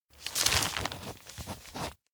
map write; anemoi

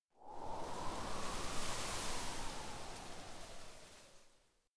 chilly wind; anemoi

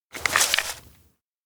box shake papers; anemoi

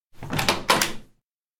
door abandoned cafe open; anemoi

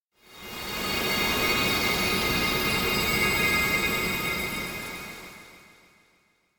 elec cry; alumias; anemoi

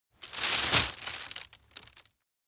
vinyl bag offer; anemoi

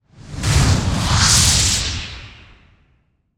creature jump; anemoi

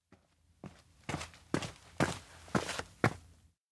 steps outdoor schoolfront walk in; aino; anemoi

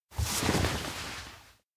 spin in place; anemoi

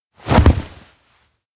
fall; awayuki; anemoi

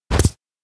catch thrown ball; anemoi

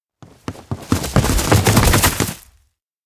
rumble many; anemoi

